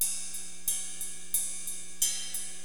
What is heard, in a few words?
RIDE LP, Hip Hop, Drums; LiveAct Pool 1